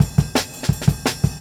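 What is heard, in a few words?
Break Full Drums, 170; Marquee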